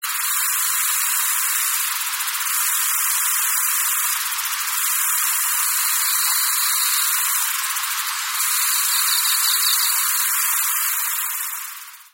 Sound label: bug